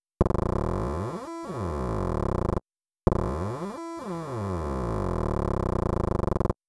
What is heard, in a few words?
creaking